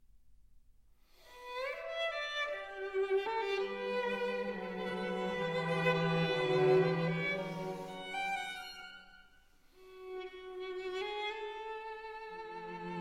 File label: String Quartet